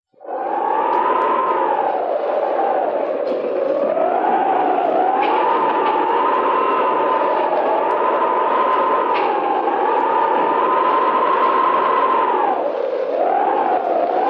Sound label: thunder